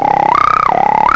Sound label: SNORT; M8; CMI Factory